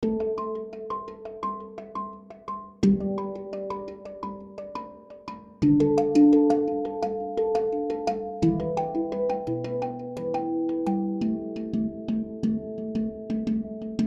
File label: steelpans; Lauri Wuolio; pianoteq8